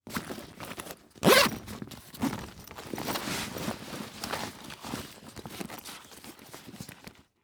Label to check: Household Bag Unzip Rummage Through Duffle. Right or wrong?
Right